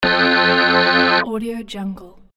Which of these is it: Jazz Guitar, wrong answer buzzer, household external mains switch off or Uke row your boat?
wrong answer buzzer